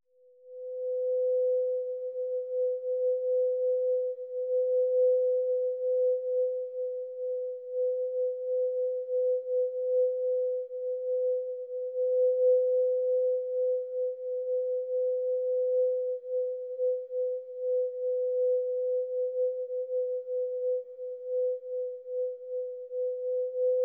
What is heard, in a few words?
Sine C3 Verb